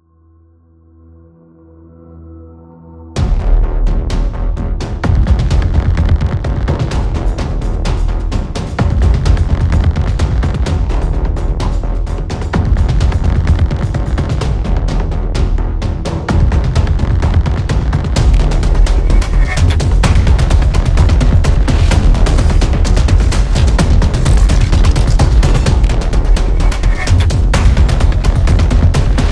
Dark Suspense

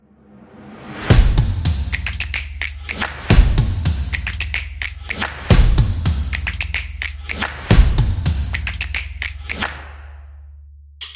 A Drum; Percussive Nation Vol 10

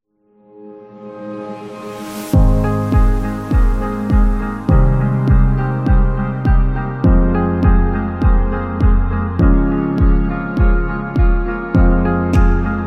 Luxury Sound

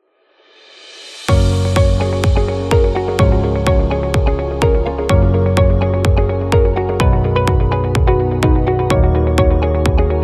Real Estate Commercial

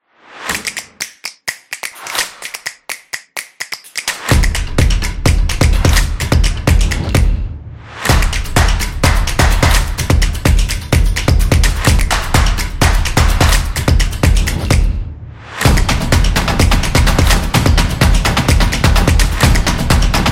Percussion Claps Stomp; percussive nation vol 3

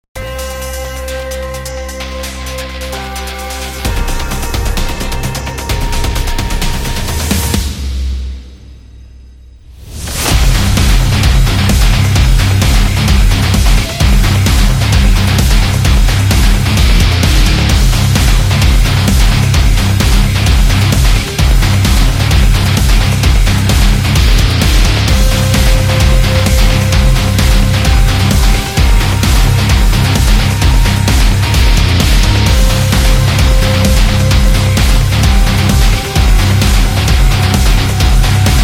Industrial

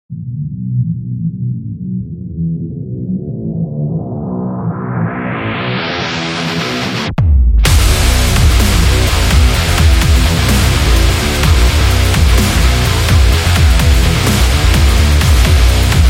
Metallic Power, metal

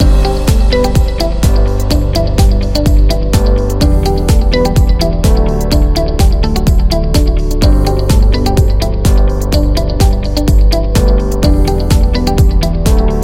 Positive Loop

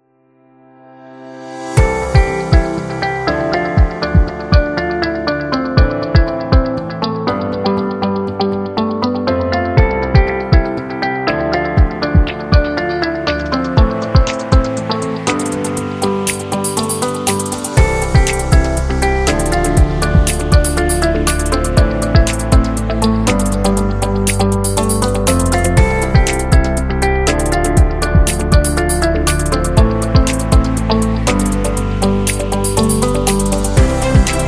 Corporate Upbeat

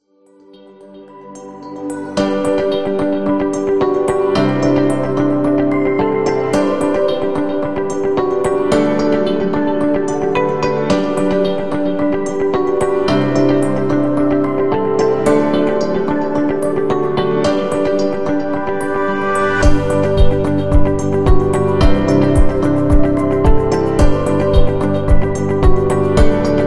Warm; agency promo

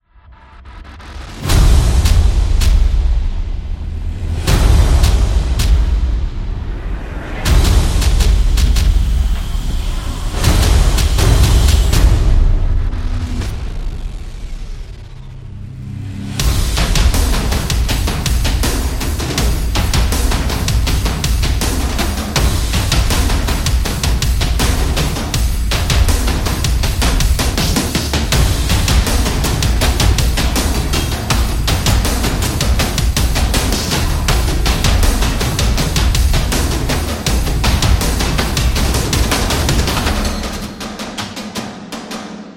Hybrid Drums

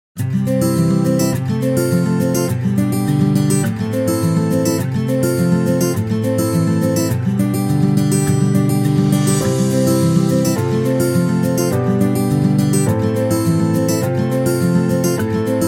Folk

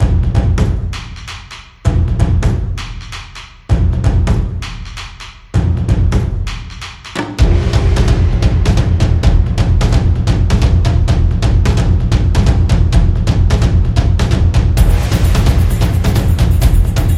Japan, tribal dance

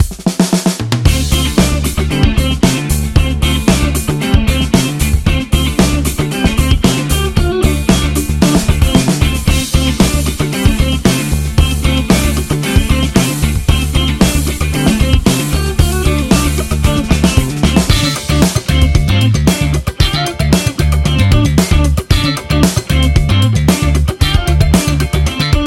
Upbeat Funk; casino

